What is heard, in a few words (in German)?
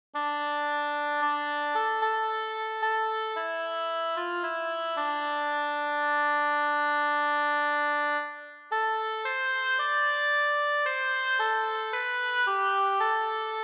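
solo Scarborough Fair Oboe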